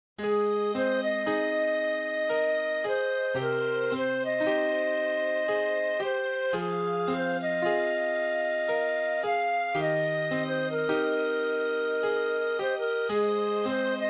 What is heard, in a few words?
piano and strings melody